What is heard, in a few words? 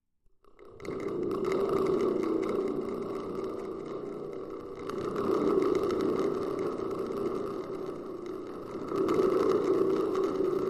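Spring Drum Small Shake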